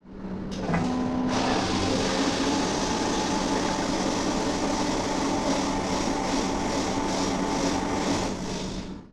large blendering blending, atmospheric ambience and background; nova sound